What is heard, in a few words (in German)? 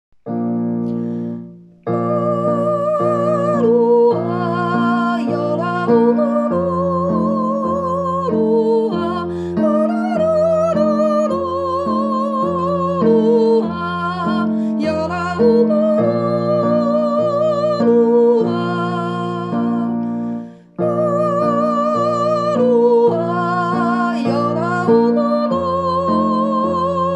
Jodel